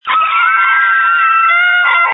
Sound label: screams